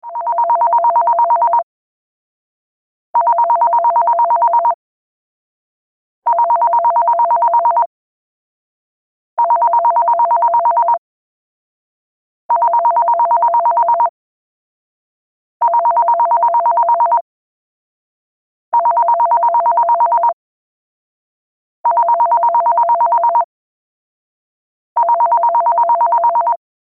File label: landline phone ring ringtone